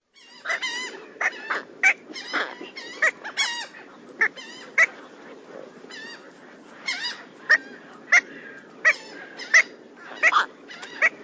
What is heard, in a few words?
Brown Pelican